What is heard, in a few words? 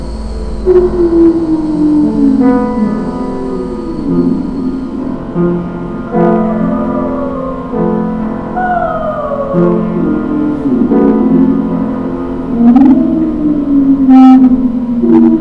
Soundscape